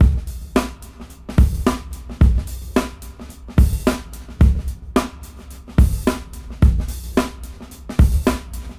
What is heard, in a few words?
Brown 105 BPM Rev; AMG - Keith LeBlanc Phat Butt Jams